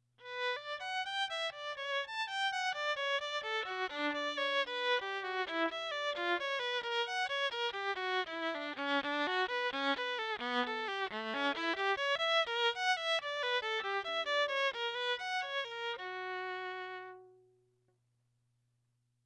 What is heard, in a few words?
Bach mono signal from Yamaha SV200; The Semi-Virtual Violin - A Perception Tool